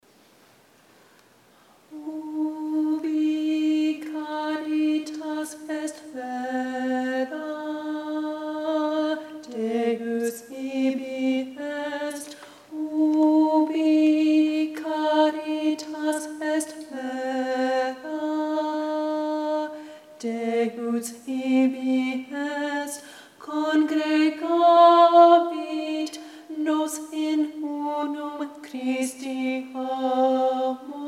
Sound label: Alto; Ubi Caritas; Lent/Holy Week 2013 Rehearsal recordings